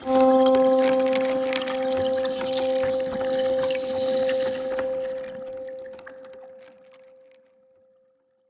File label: Underwater Textures; Strung Together